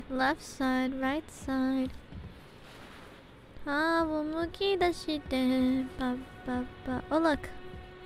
Singing; IRySinging

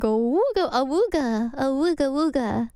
awooga

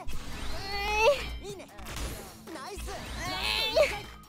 nnnnnyeh

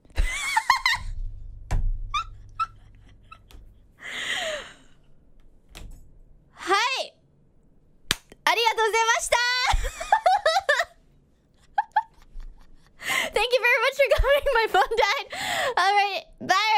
Laugh; IRyS

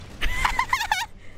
laugh

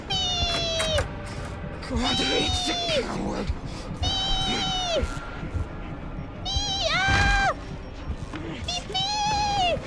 piiii; noises

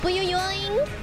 boiyoiyong

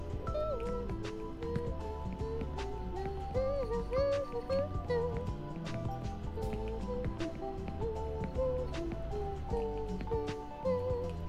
Singing humming

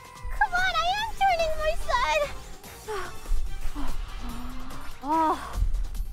noises

